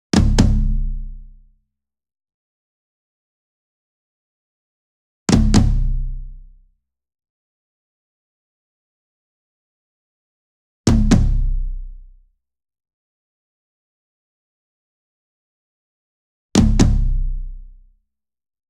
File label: ENSEMBLE DRUM Concert Toms Double Near; boom library; Cinematic Strikes Construction Kit